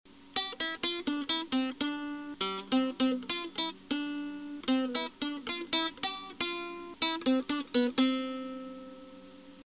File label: finger style